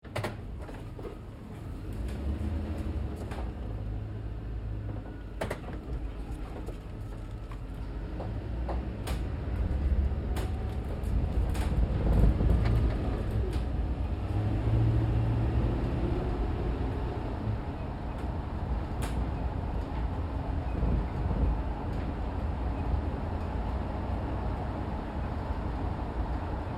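Truck GMC Savana interior Drive Accel Decel Medium; Trucks; Big Room Sound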